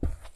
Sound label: carpet Walk